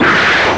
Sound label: MISSILE loop